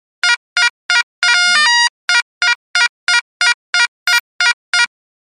Beeps Small Small Bee

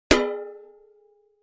Impact Metal Bucket; barrels buckets & cans

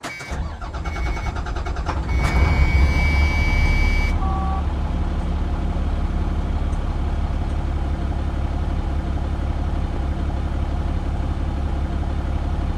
school bus start idle

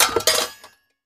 Junk Thrown Box, junk crashes

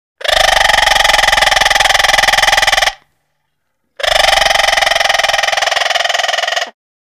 Macaw Vocalizations

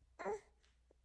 Baby: 10-month-old, Single Grunt.; Mono

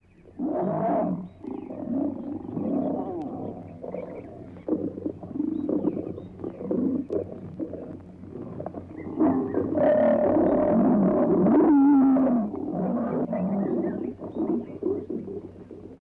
Lion Growls Moans Dis; big cats

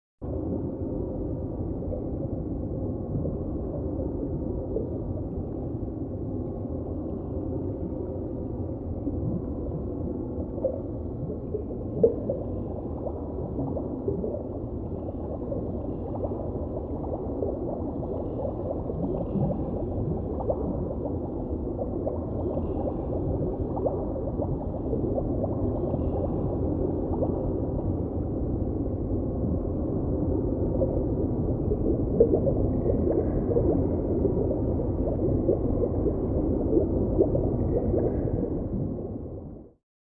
Underwater Ambience

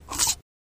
Air Release Soda Can O; eating & drinking

